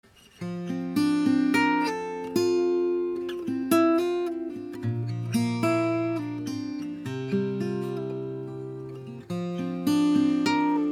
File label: picking guitar